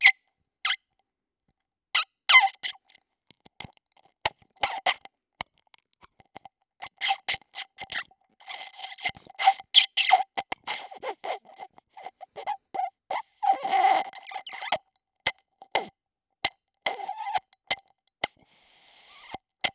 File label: clarinet